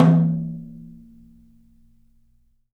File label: 3D Percussives, Studio Kit